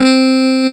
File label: Casio CTK-611 Muted Trumpet C4